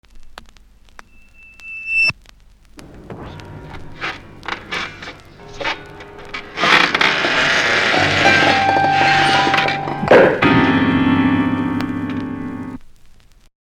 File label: Man Dragged Out Of Piano